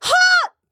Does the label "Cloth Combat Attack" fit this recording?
Yes